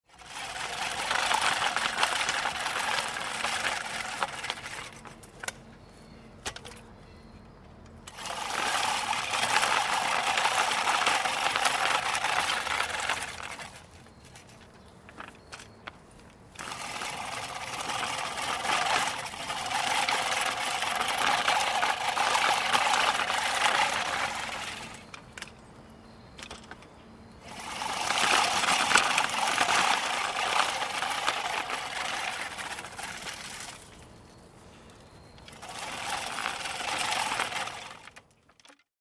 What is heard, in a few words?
Hand Pushed Lawn Mower At Various Speeds, Cu Perspective.